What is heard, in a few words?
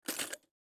Field Item Get